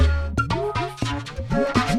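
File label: Music Base 120; From Dust; ps3; xbox 360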